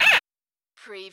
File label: CARTOON SQUEAK